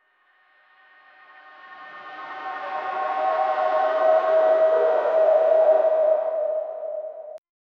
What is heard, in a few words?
passing through the time, time travel